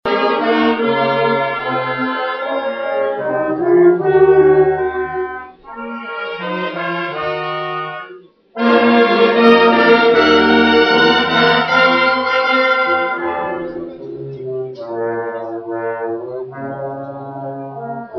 Духовой оркестр; Возрадуйся душа моя; КБ Ростов-2009

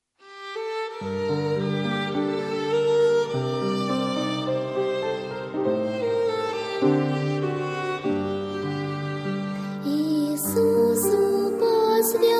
гимн Иисусу посвящаю; Блаженны чистые сердцем

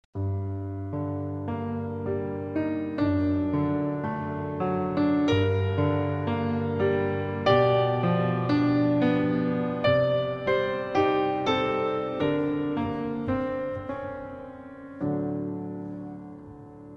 Музыка; О молитва; Лети, наша песня

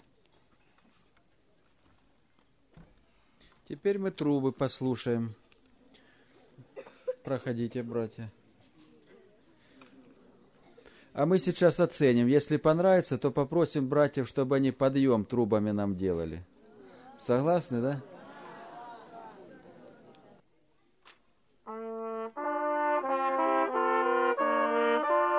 Трубы; Шалом хаверим; Подростковый поток Апшеронск-2010-А

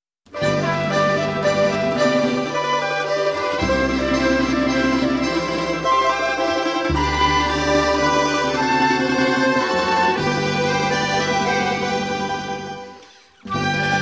Музыка; В слове своём Христос учит меня; Пашешь-паши с надеждою

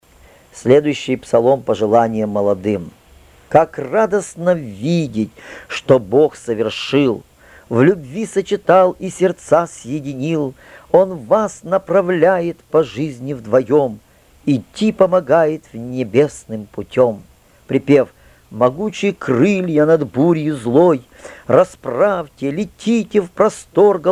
Пожелание молодым (псалом)

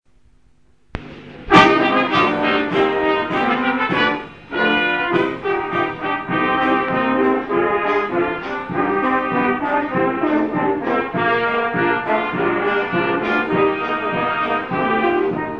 Духовой оркестр; МО в г.Белая церковь

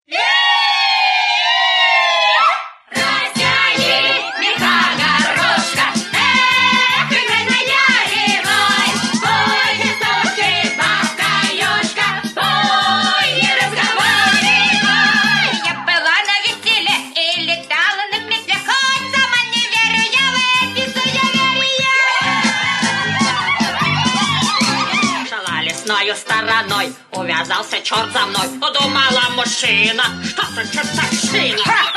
Частушки бабок-ежек; ДЕТСКИЕ ПЕСНИ ИЗ МУЛЬТИКОВ

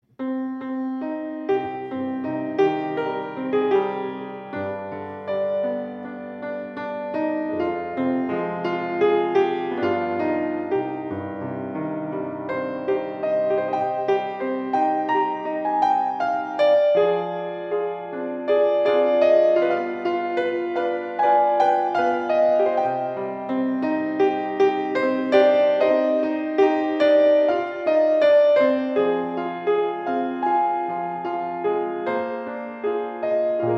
Пианино; Музыка христианская; Консервативная; Мой дом на небе

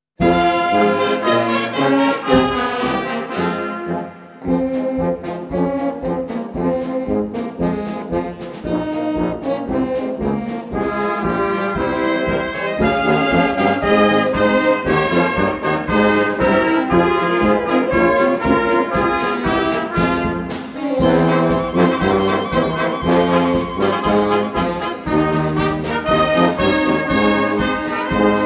Духовой; Одаренные спасением

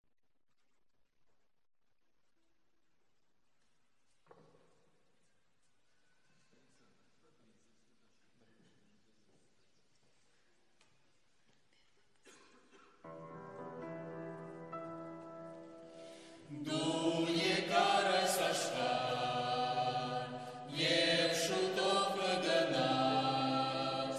Пение на армянском; конфер по Благовестию Апшеронск

